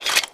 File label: pistol clip out, weapons; m6h2; unsc